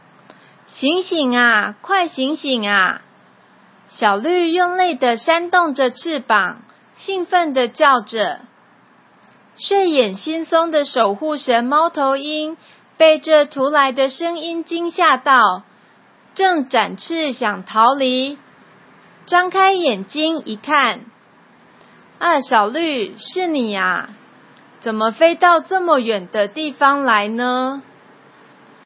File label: music